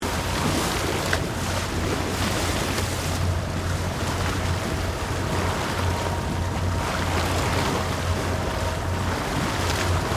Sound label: Boat Slow Speed, Water, Splashes, Loop; Transportation, Watercraft; Police, Marine, Division, Cherokee; Boat Sound Effects; Blast Wave